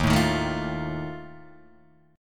D sharp Suspended 2nd Flat 5th, Guitar, Open D

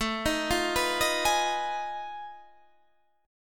F 7th Sharp 9th Flat 5th A

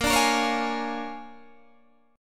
A sharp 7th Sharp 9th A sharp down Guitar Open G

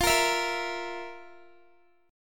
F Major 7th Suspended 4th, Guitar; strummed

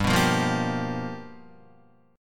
G 7th Sharp 9th G, Guitar; G7#9 strummed

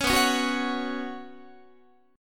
B Suspended 2nd B, Guitar; Bsus2 strummed; 16,19,16,16,17,17; 4A,4E,5A,5D,5G#,6C#; JGuitar

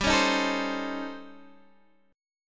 A 7th Sharp 9th Flat 5th

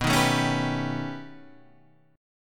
B Augmented 9th B, chord

